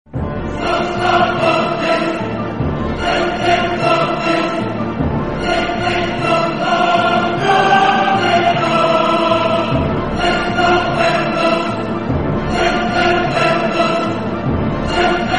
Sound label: Theme Bgm Ringtone